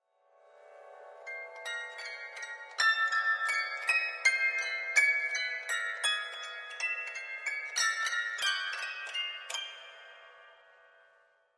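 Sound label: Grand Piano Strings High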